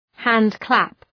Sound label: handclap